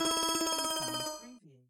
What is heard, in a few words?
outlane rollover; BALLY; TWILIGHT ZONE; Pinsound; Twilight-Zone-Full-Reorchestration